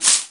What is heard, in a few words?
spray; trilogy